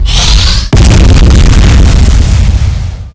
kanal explo; starship trooper; music

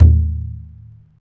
error, menus; PC Gamer IT CD 45; SMANDEMO

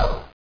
slide; xpired

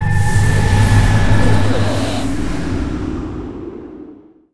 player ship reborn; Earth and Beyond; EA Games

